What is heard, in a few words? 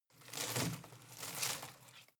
home plant leaves rustle rattle long